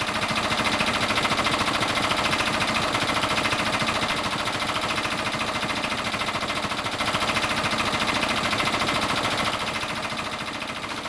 machine loop; GrandFantasia

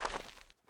footstep; Mount&Blade Warband

